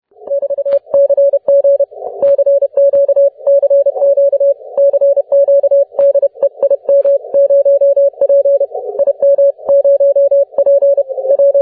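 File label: 40m continuous wave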